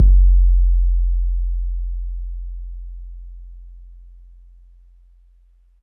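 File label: bass drum 808 MPC60 Smooth F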